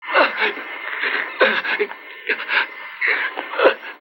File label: OOH, Spoken; Sonic Foundry (Sony Creative Software)